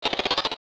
Tech, Weird Side Samples

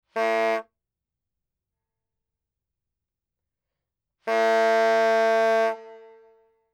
Horn Sounded Twice; BBC Sound Effects Library

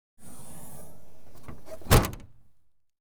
Car, Exterior, Hatch Opens; Auto; BBC Sound Effects Library

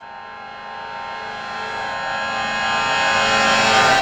Slaughter Swell; Glitchedtones; Atmosfear

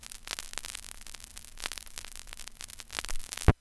vinyl crackle; 99Sounds; Vinyl Noise SFX

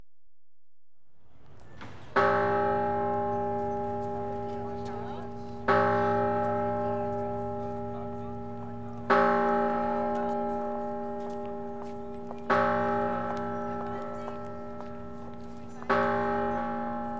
Independence Hall Bell, Philadelp; America; BBC Sound Effects Library

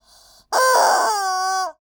TOYS Squeaky Chicken, Noise, One Long Squeal, Low; Articulated Sounds; Squeaky Chicken Toys Vocalizations

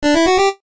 jingles NES; Kenney's Sound Pack; Music Jingles

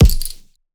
Punching Perc, Straight Glove (Chain); 99Sounds; Punching Percussion

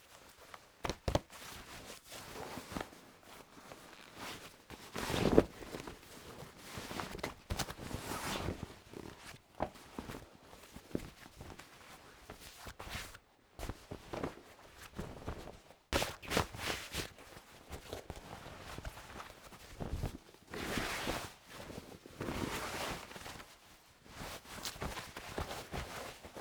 PANTS JEANS MOVEMENT HANDLING OFF ON; Cloth Foley; The Soundcatcher

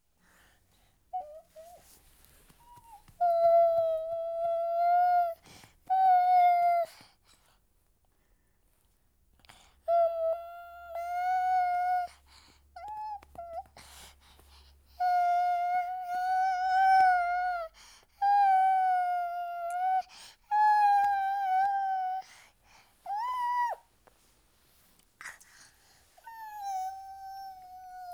Month Old Boy, Singing, Babies; BBC Sound Effects Library

